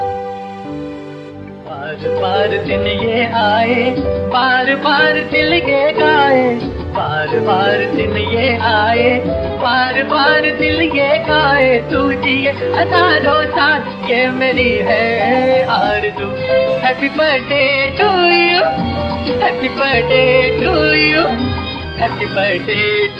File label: Hindi Birthday Song